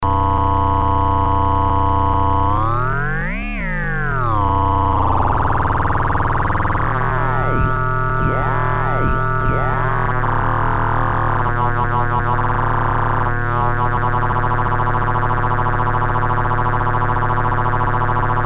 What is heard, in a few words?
FM on sample as oscillator